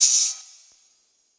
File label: Hat Open; Closed Hats